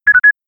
Triple Beep

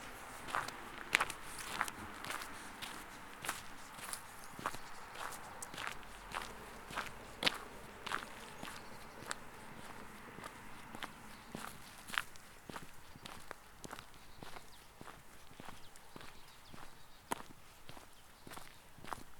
Steps Macadam Asphalt

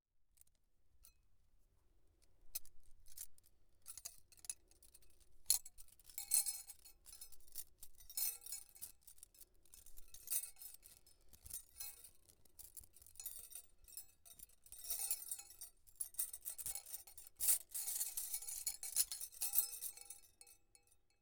Bangles Put On Arm

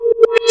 Boop

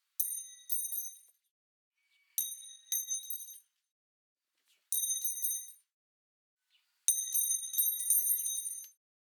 Metal Pin

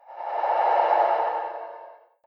Alien Ghost

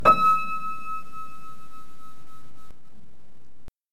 Piano

